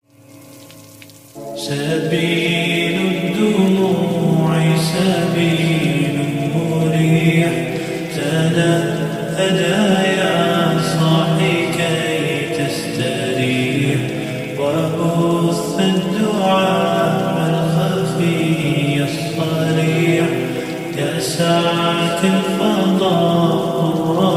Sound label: slow arabic ringtone